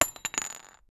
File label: sound of falling brick, impact